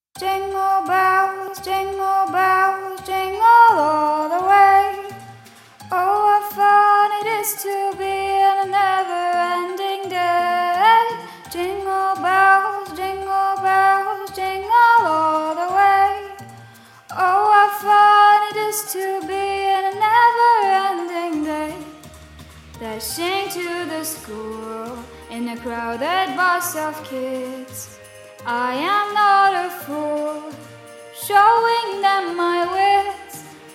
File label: Jingle Bells